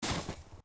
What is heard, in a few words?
FS Snow, footsteps